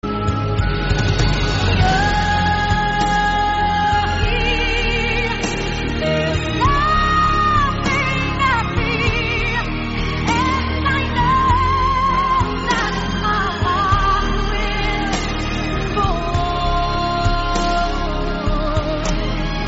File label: singing mic